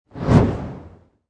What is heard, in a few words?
Fire Torch Single Pass By Whoosh, Quick fire swoosh; fireball flying through air or torch flame swipe through air